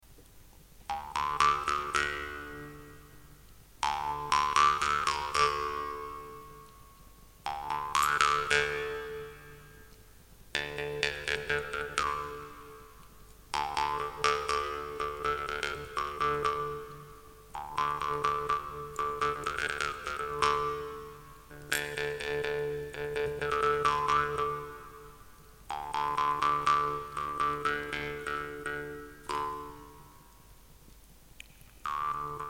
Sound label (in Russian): Doromb Hard Echo